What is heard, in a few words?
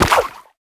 Background Otter attack